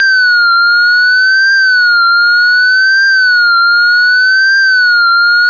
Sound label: tone